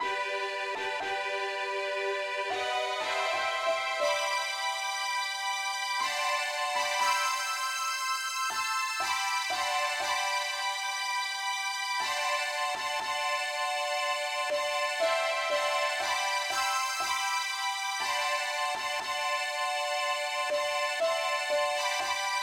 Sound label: uke lead, key g; pilot